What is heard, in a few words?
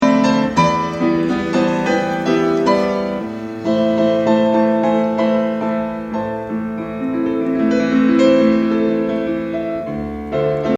piano